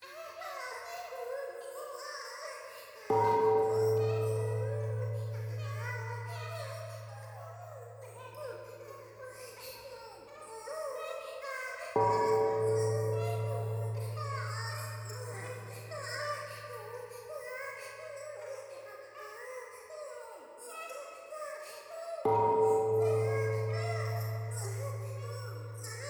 可愛い喘ぎ 神社

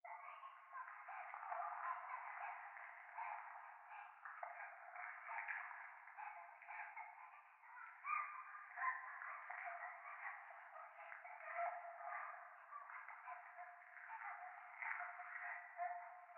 教会 可愛い喘ぎ 触手

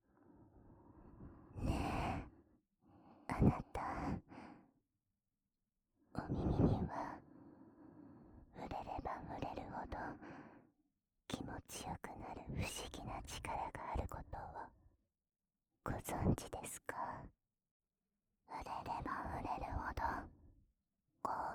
あまあま幸せ耳キス&耳ふーふー, ASMR

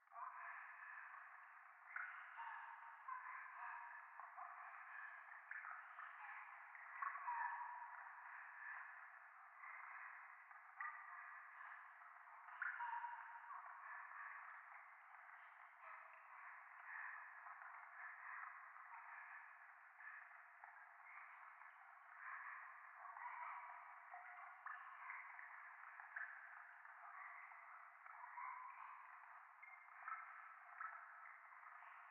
廃墟 右耳密着処女喘ぎ 雨上がり

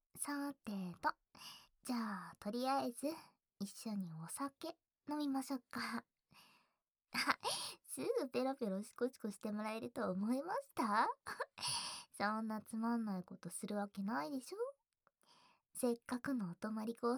耳舐め, ASMR; 耳舐め雑手コキと焦らしカウント

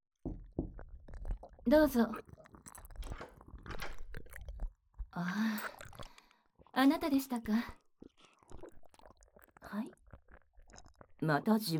耳舐めされながら、ディープキス・乳首舐め・手コキ・騎乗位・正常位・バック・寝バックする音声; AVメーカー社員のベテラン性処理担当さん

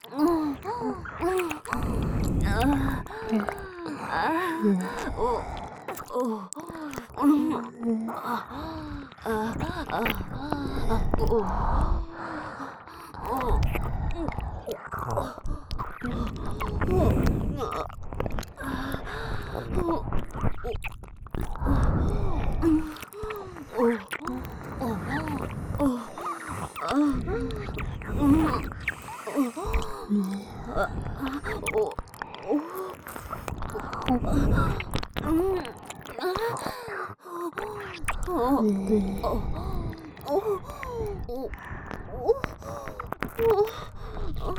オホ喘ぎ＋両耳舐め

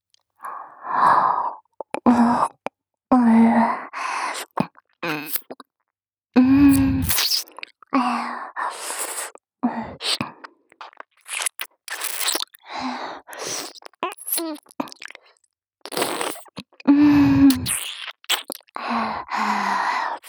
左耳舐め, ASMR; サキュバスな新妻の左耳舐め; SE有り

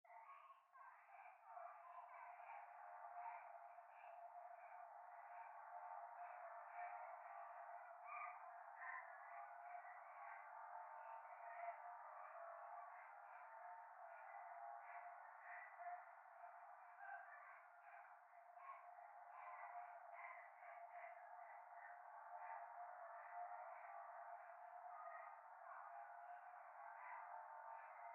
可愛い喘ぎ 車の中; 夢の中

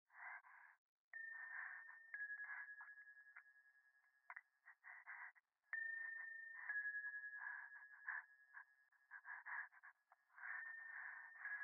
電話 右耳快感吐息 神社

